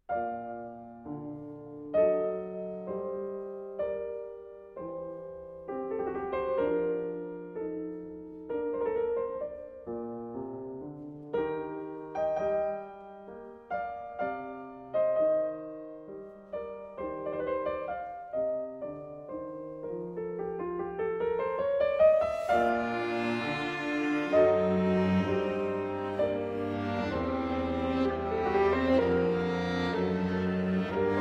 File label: Piano Quartet in G Minor Andante